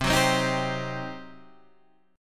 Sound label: G Major 7th Suspended 4th Sharp 5th C